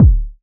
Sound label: KICK